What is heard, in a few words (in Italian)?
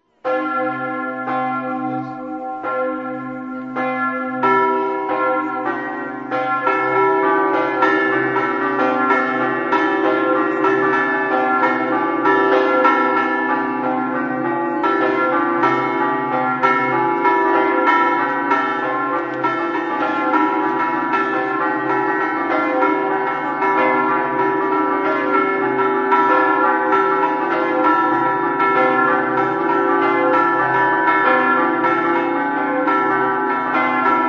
gorto, campane